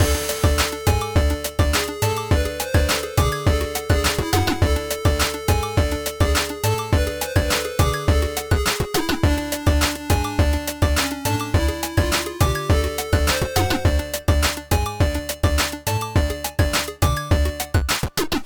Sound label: lead; cube-tube; pyramids